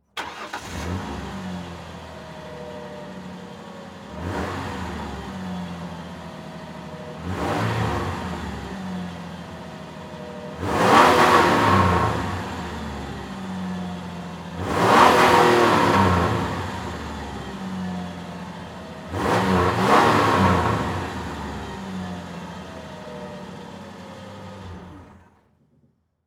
Truck Ford Ranger Start Idle Rev Off Mic In Rear Short; transportation